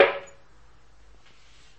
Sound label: Kick